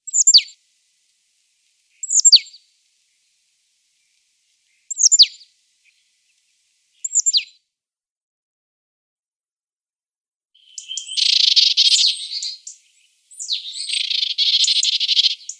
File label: Marsh Tit calls